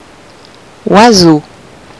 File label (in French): oiseaux